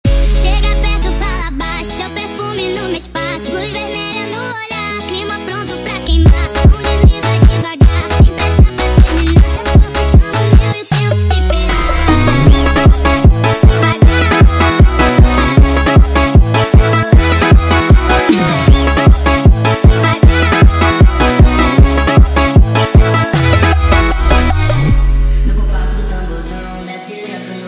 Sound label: Montagem sped Up